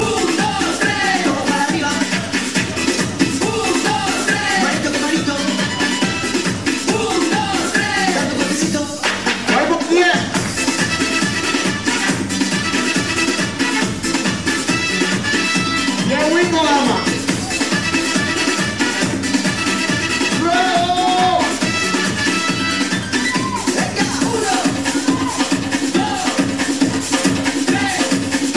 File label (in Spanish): uno dos tres ringtones